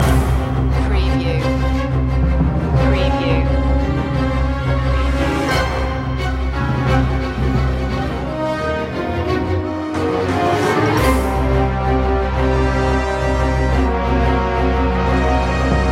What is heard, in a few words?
orchestral action loop